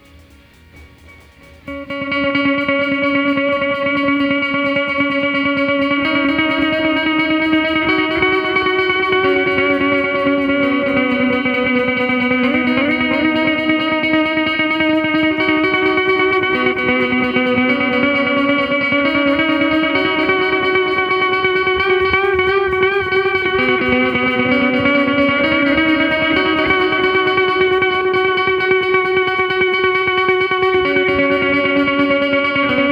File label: delay guitar; greg delay guitar